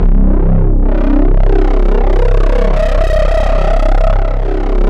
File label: SYN LO RE; AMG - Now CD-ROM (Roland); Pure Gold